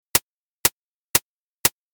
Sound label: hat